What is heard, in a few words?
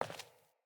Sandals Walk Default, Footsteps